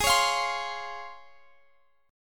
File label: C sharp Major 7th